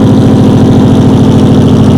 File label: dodge 71 onverylow